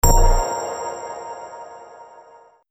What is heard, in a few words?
match start; Crimson Stella